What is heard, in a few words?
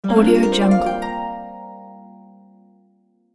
valentine love harp